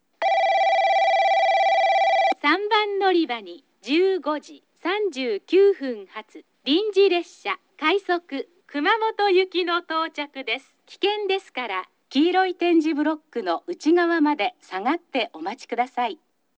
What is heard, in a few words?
kurume SL hitoyoshi kumamoto